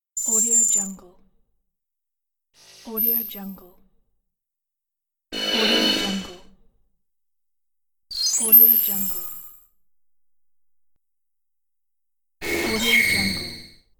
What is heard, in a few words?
holographic image appears